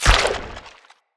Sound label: Jump Mushroom Activate; Puzzles; BeanStalk